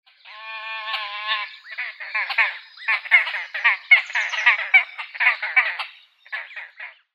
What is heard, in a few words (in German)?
Haubentaucher Podiceps cristatus; Vogelstimmen